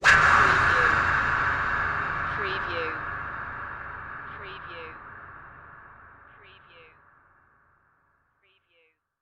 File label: Hit Wispy